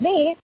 warped; Ze Franks Pain Pack

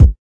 kick

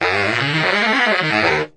sax scale; HORNS SAX TRUMPET BRASS

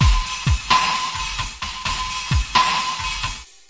130 bpm slow infusion, drum loop

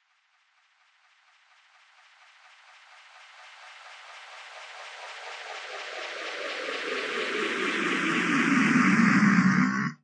grainy sweep; Ze Franks Pain Pack; sweeps and fx

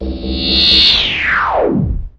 powerup

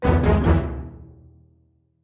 orchestra hit melody; multisampled

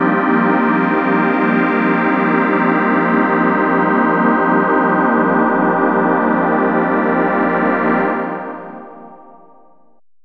pad transcendent; Pads

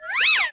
vintage slide whistle up down; Cartoon Sound Effects Kit

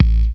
kick

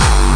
obnoxious kick

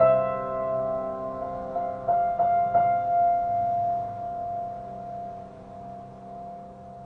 piano; work-sin-progress

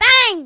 Electro FX; Ueb HE Electro FX

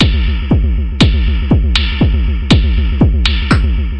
permanent wubble bass techno

